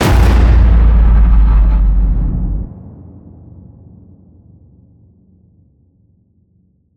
HIT Distorted, Cinematic Hits Designed

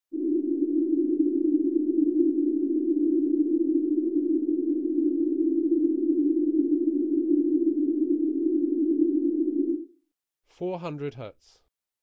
315Hz; Alan Parsons & Stephen Court - Sound Check 1993

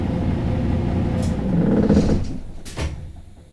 bus motor stop, street traffic; best service Blue Box

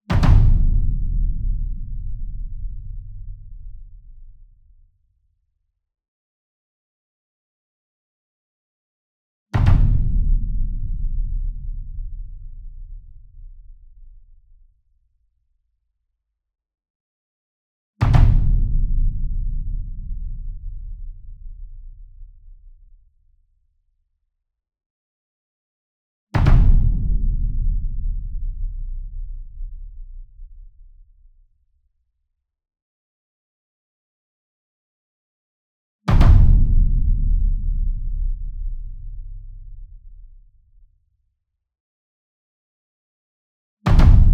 SINGLE DRUM Verdi 66 Inch Double Hard Beater Near; boom library; Cinematic Strikes Construction Kit

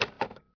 OLD HANGUP; skype

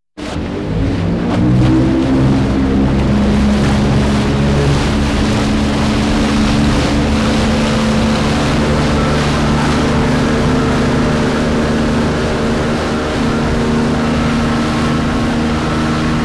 Boat Crusing On Open Sea; KMP Film Video Sound Effects

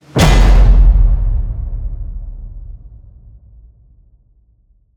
HIT Massive, Cinematic Hits Designed

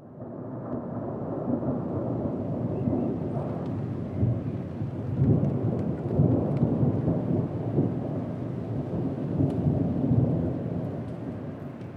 thunder rolling; best service Blue Box; rain thunder

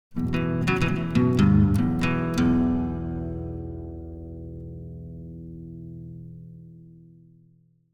Acoustic Guitar - Acoustic Melody - Lower Part; South End; Hollywood Edge; sound designer's toolkit v2

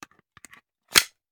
Hand Gun Foley; Sound Ideas; Guns

